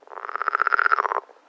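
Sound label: ambience China 3D ambience; Rabbids Multiverse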